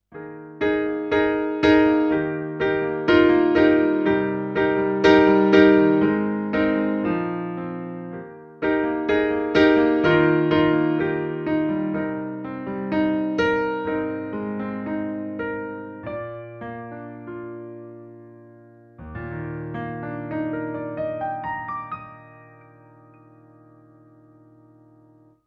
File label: Numa Compact 2 Stage Piano